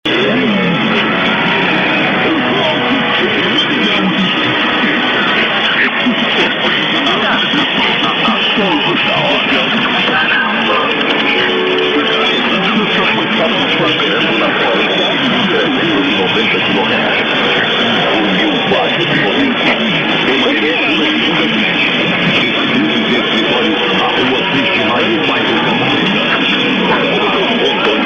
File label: yl singing id; 1390; planalto